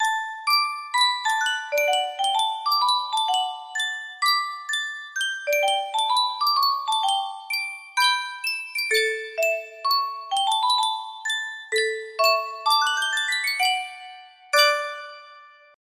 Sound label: Sankyo Music Box